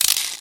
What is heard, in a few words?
357 spin, weapons; Source Engine; video game